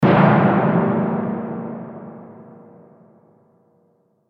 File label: ambient space timpani